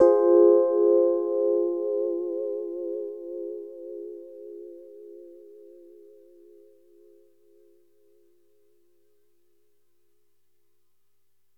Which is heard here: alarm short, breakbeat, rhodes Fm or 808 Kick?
rhodes Fm